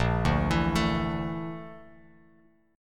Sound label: Bb Major Sharp 11th, Bass Standard; arpeggiated; JGuitar